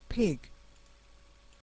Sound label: pig